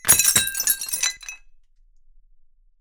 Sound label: glass break